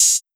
Open Hats

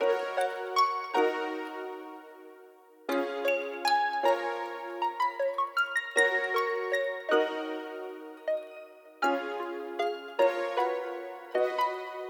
156 bpm; RaumBeats - Circles

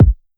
kick

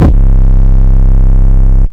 distorted [ 808 ]

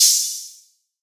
OPEN HAT; OPEN HAT 2

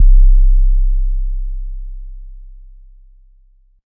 808 C; SOUTHSIDE 808 existential

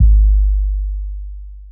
808 Drum Bass

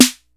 SNARE

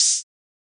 Open Hat; NIX - Atlanta

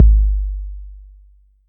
808's

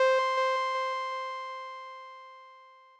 Lead, Synths

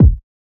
Kick - G#; Cymatics - Astro Gazing

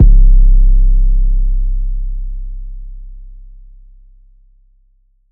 Dragged Sample

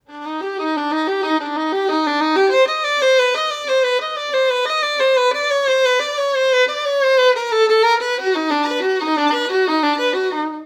Loop

